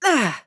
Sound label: Grunt Attack; paladins; Imani